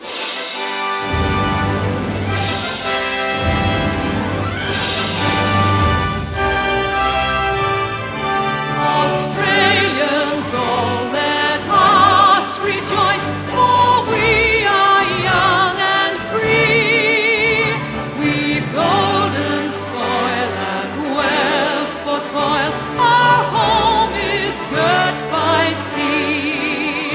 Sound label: Aus anthem vocal